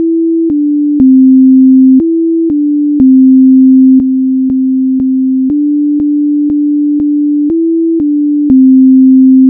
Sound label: sine